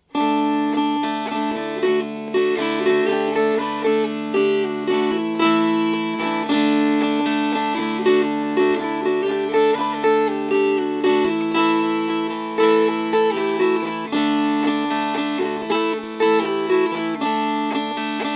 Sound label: Strummed; Dzisiaj w Betlejem